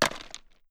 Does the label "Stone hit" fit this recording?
Yes